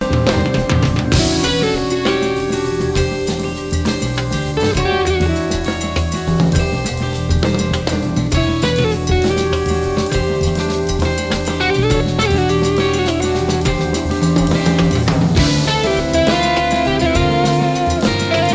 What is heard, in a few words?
New Instrumental